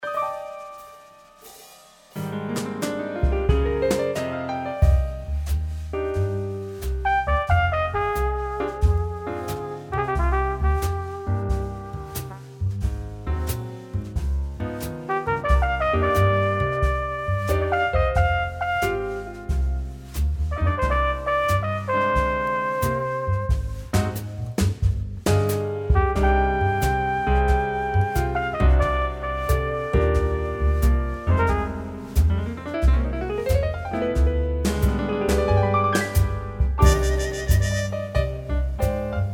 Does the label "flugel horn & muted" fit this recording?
Yes